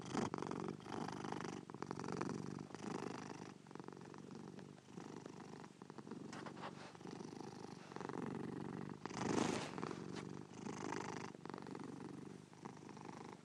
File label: whiskey purring